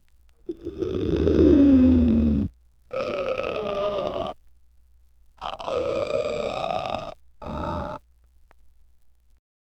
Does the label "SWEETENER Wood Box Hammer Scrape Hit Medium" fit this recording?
No